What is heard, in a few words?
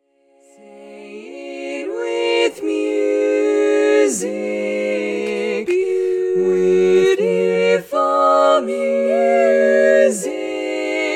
Youth Upper Voices, barbershop; Learning Tracks